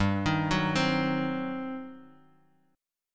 G Major 7th Suspended 4th Sharp 5th, G, down, Guitar, DADGAD